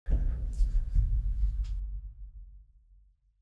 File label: Impacts; SFX; Experimental - Other